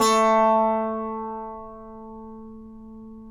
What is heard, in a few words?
SAZ A 3; Spectrasonics; Hans Zimmer Guitars VOL-2